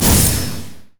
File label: dragon strike; LoL; jarvaniv lubu